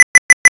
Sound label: 4 Beeps